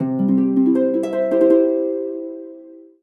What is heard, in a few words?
Solo Harp Positive; MUSIC EFFECT; startide